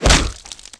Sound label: hit blood, skater; Tony Hawk's Project 8; XBOX